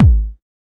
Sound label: hardbassdrumz, breakcore; Bazzdrumz